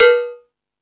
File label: Thundadome, E-MU Pro-Cussion; drum machine